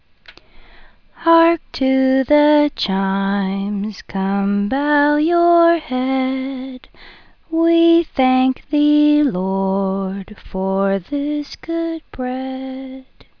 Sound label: Hark to the Chimes, Graces